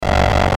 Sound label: Chopper Digital Buzz Short Fast; Blastwave; Chopper Sound Effects